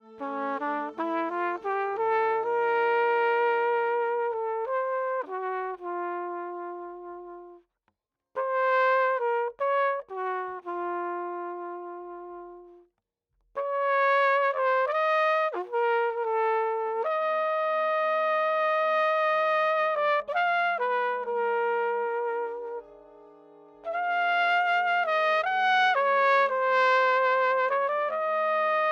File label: Flugelhorn; Sennheiser